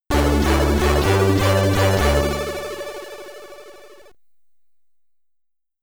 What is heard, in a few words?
Get Ready!, SID Stereo; Navy Seals; Commodore 64